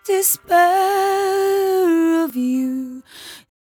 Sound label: 57bpm Am, Velvet Vocal; Zero-G